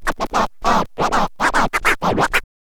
scratch kit, vinyl

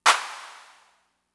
Clap, Fricke MFB-522, Drum Machines

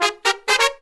Phantom Horns, 132BPM; Zero-G

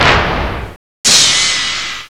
CONSTRUCTION; Rubber Chicken Software Sample Bank

